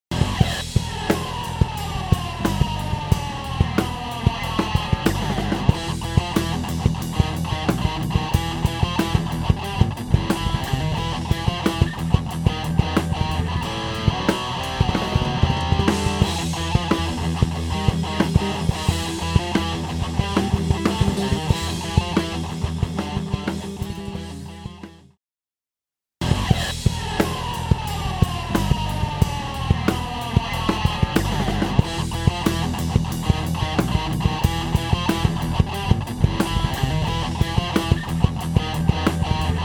Tegeler Audio Manufaktur Schwerkraftmaschine Diode Pressor